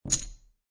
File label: Poker Chips Two Chips Dropping On Felt; Gambling